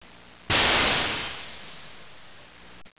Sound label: steam